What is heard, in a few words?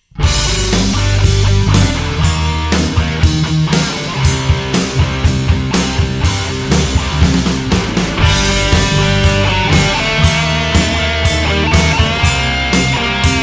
KPA Distortion; Kemper profiles